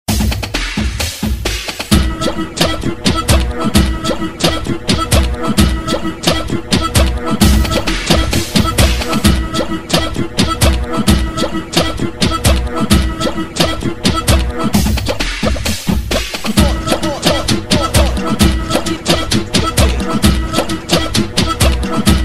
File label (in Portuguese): base de funk